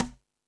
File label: Latin Drums, E-MU Pro-Cussion